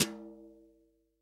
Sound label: TOM, REAL DRUMS, SWING KIT